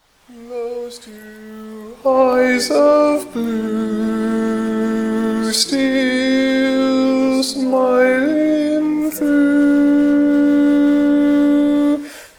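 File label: barbershop tags, baritone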